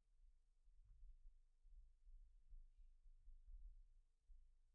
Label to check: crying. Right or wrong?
Wrong